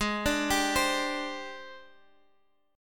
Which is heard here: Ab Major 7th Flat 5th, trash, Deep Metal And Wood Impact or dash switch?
Ab Major 7th Flat 5th